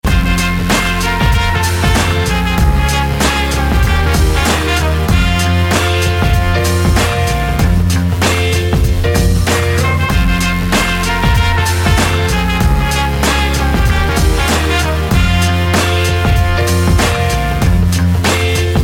phunky fanfare; Jazz

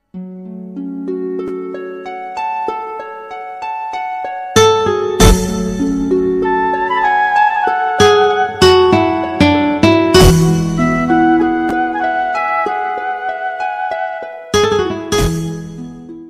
soft tone

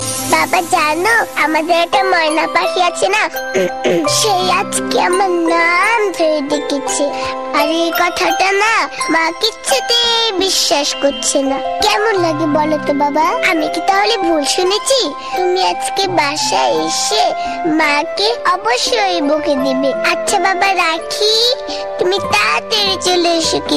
Bengali Baby

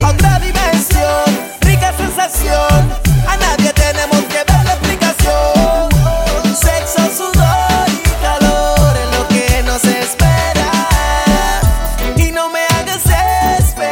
remix; Latin

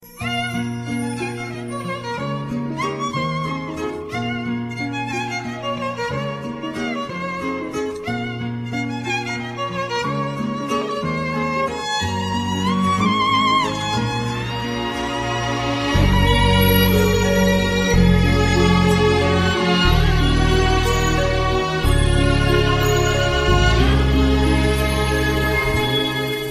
quiet ringtone

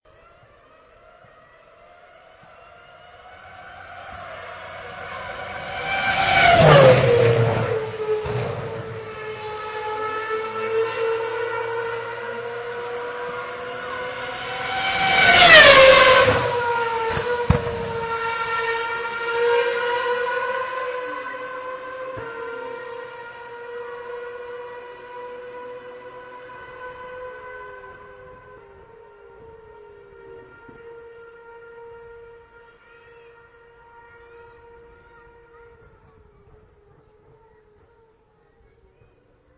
formula one; iPhone

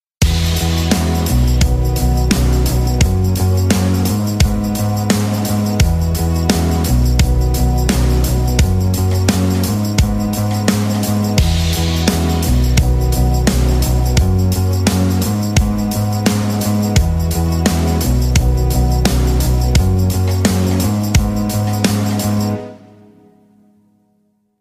instrumental remix

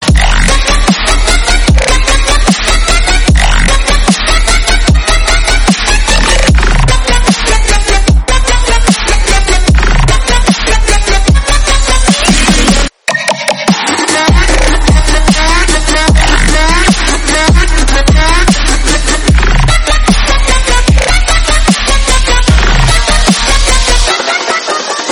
dubstep; iPhone

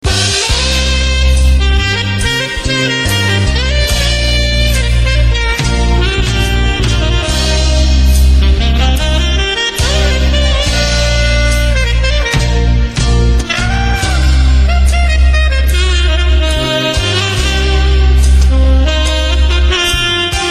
smooth jazz saxophone

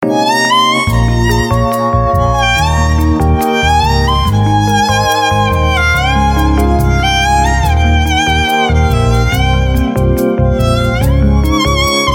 best violin; Electronica

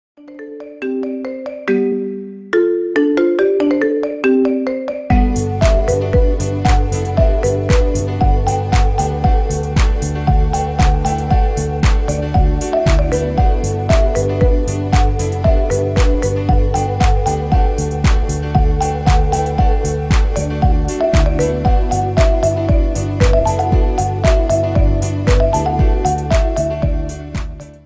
marimba ringtone remix

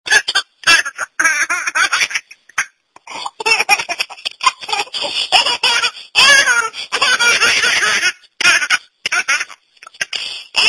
laugh

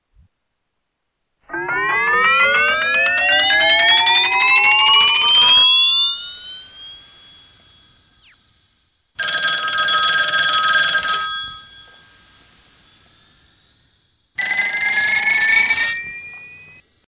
old school ring mix

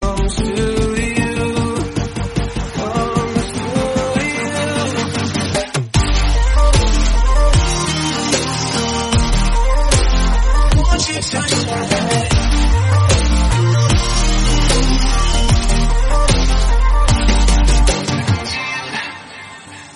pop ringtone

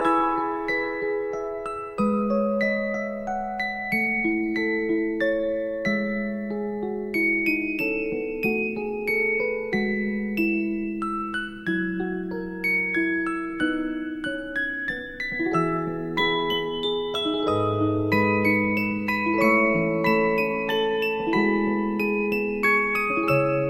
music box cool

